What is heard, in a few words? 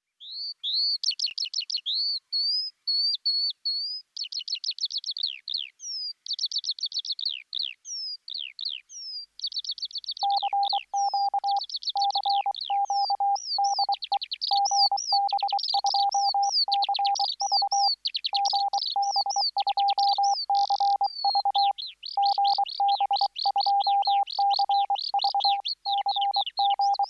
skylark morse code